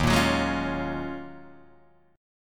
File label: Eb Minor 11th Eb down Guitar Drop D; Ebm11 strummed; JGuitar